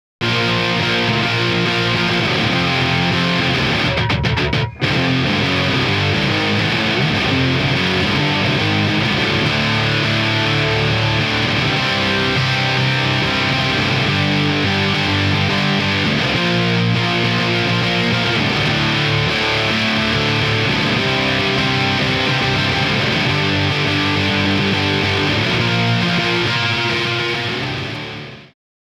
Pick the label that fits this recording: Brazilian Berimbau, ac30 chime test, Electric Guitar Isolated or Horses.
Electric Guitar Isolated